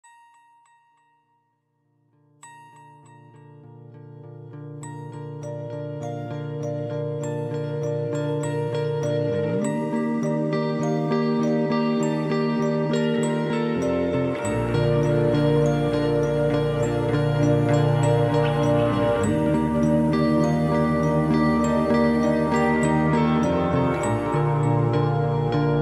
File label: Delightful Morning alarm melody; Mudita Harmony